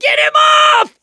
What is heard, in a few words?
scream while pounced, mechanic, survivor; voice